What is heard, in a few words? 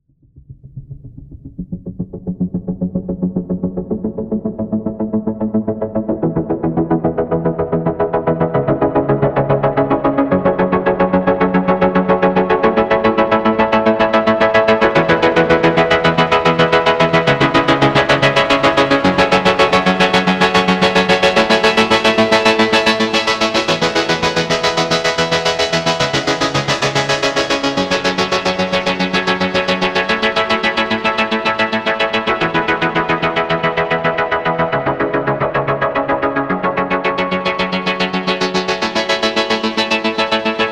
Filter Saturate